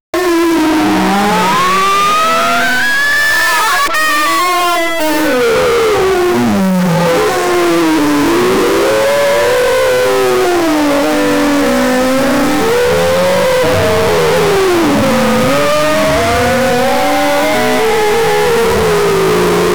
plants that grow aggressi

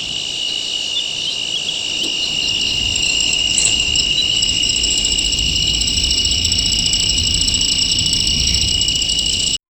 deep inside a lush tropical, tropical

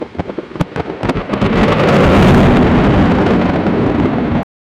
the landing sound of a par

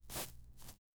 ground

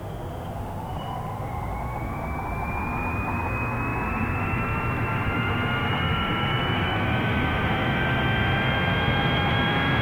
Boeing 737-800 window rec, jet

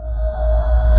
fantasy earth spell

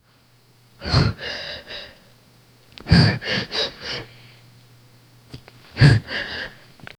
two men struggling

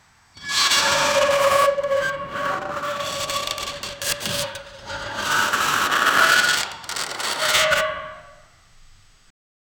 workshop

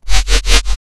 robot

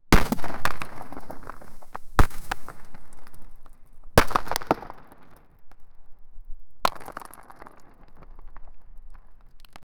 massive rock wall brake

